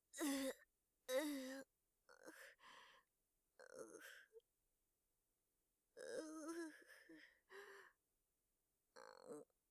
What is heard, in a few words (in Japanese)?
気絶が浅い時; 効果音なし